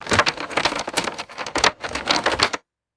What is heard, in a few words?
rummaging through hard game pieces in box